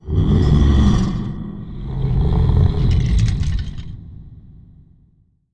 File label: supermutant sigh